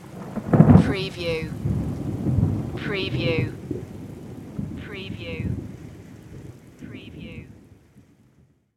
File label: thunder